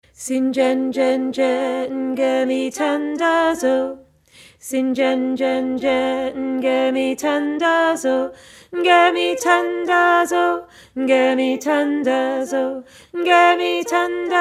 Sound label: harmony